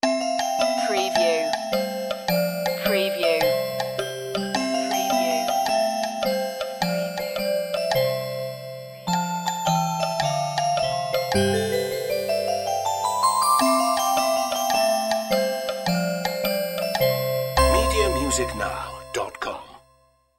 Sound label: childrens play